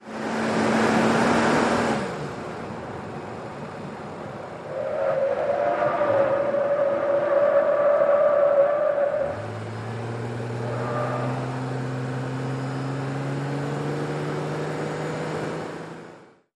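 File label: SUV Truck Accel Corners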